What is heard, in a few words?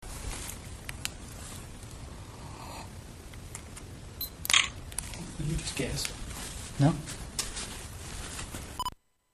gasp; Eastern State Penitentiary, PA; cellblock4